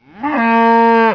yak death; Emperor - Battle for Dune

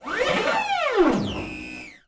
Servo Med; Sid Meier's Civilization 4 Complete; Beyond the Sword; Final Frontier; Units

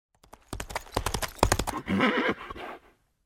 Horse; Age of Empires III

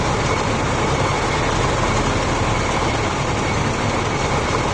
tank engine

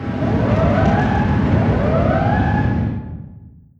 distance light cruiser defend damaged; Hearts of Iron IV Death or Dishonor

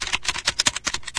keyboard fast, machines; ambient; Garry's Mod; hl2

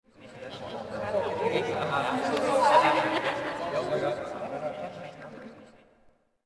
City Crowd; Ambient; Sid Meier's Civilization 4 Complete; Beyond the Sword

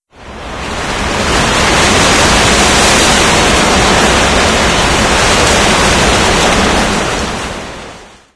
mono gust; ambient, ambience, rainscapes, rain

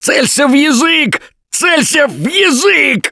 Choke, russian, Survivor Voice; Gambler

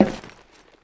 metal, footsteps; Counter-Strike Global Offensive

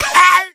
smoker pain short, voice; Left 4 Dead 2

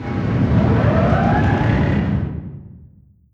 distance light cruiser attack damaged; Hearts of Iron IV Death or Dishonor

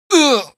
pain; Garry's Mod; hl2; Barney

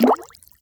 water impact; AssaultCube

